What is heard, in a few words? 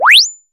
BUBBLES; Crypton - DrumBase5000